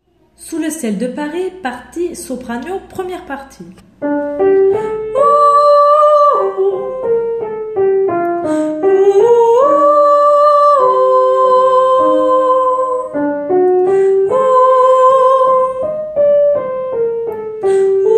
Soprano

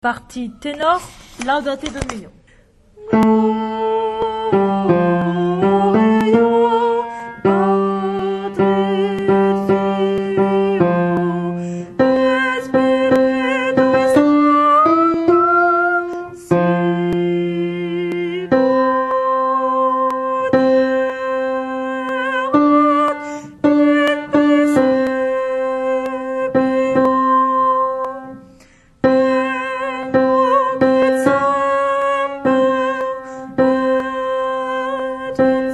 Laudate Dominum Tenor; Mozart

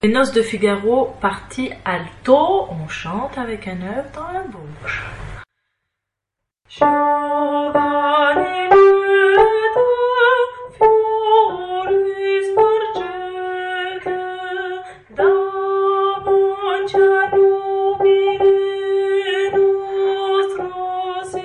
Alto; Giovani Liete; Mozart

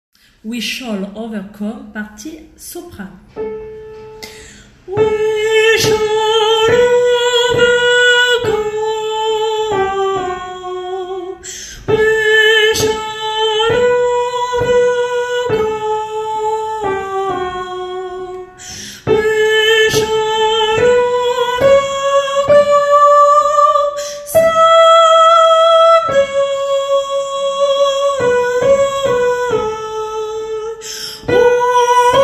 soprano; We shall overcome

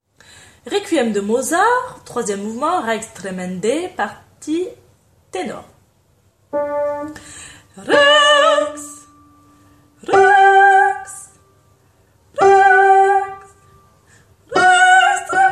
Requiem Rex Tremendae Tenor; Mozart

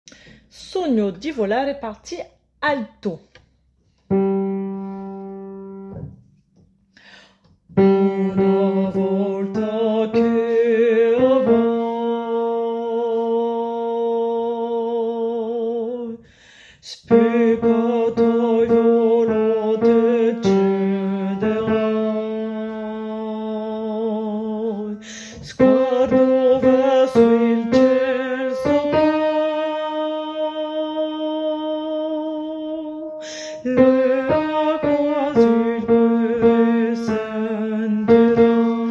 Alto

